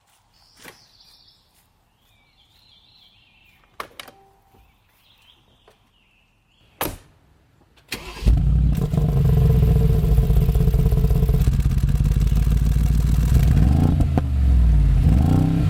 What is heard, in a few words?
G Class exhaust